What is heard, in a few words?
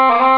Chanter; AWE; WORLD.SBK